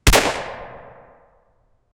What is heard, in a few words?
a single shot from an, rifle